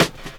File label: SD, Dance Drums